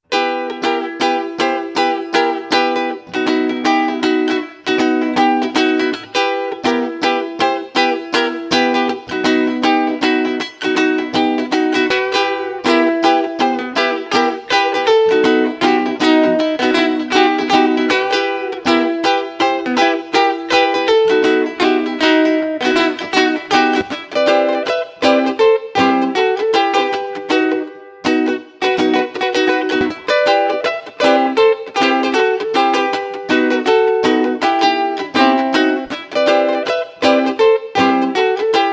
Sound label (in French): falaises guitar